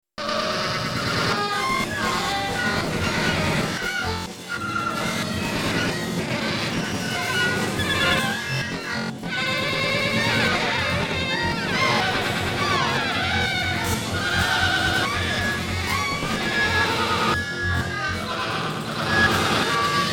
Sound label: ensemble, perc rolling distorted